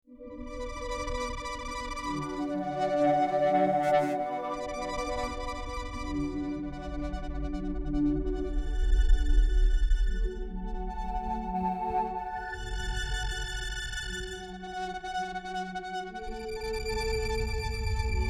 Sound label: Bm 120 Korg chords